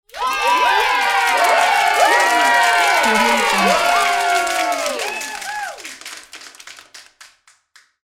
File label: crowd clapping and cheering